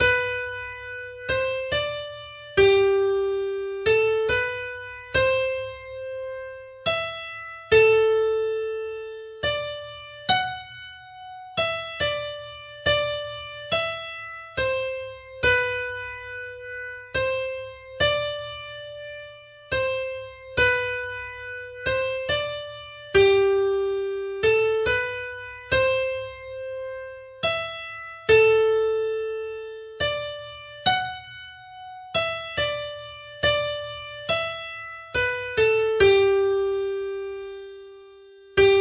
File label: slow Waltz; Mudgee Waltz